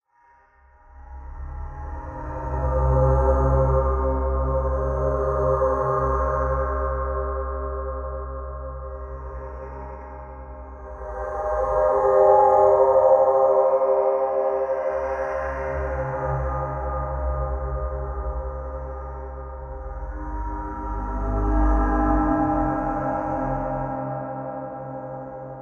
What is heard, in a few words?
Sci Fi Atmos Deep Dream, ambience